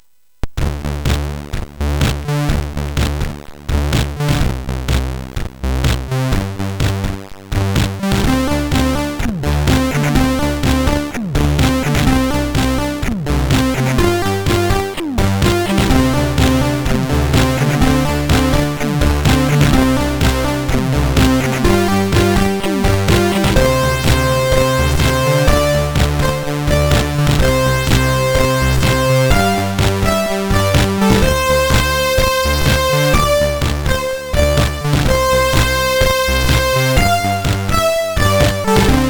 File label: Rock, MOS6581R4; hvsc